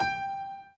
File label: Piano Chord